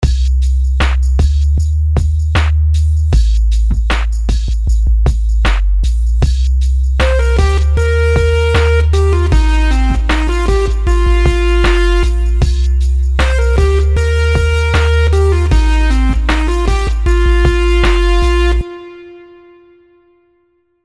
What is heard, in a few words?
melodies simple 16s Cmin; melody